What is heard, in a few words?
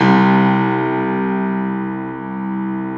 Classical Piano; C0; Best Service ProSamples vol.53 - Classical Prepared Pianos; Total Piano; Brilliand Classical Piano; Classical Piano mp +ped